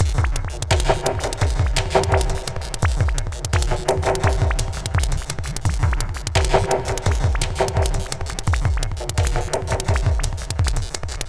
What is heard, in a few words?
Quantum, Processed Percussion